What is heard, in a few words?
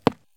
wood punch; PixelDefense